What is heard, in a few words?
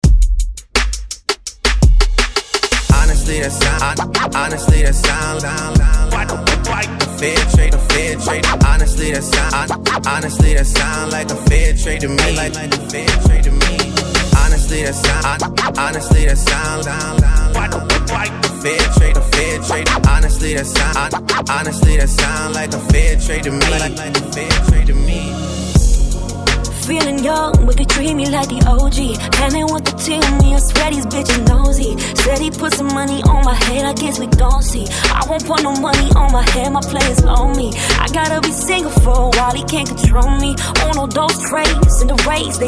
redrum breaker hype 84 bpm